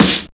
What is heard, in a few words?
smooth steel snare drum sound g key; snare drum samples